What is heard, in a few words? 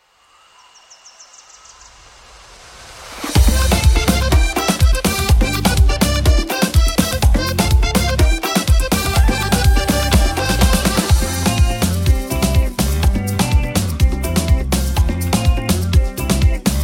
Instrumental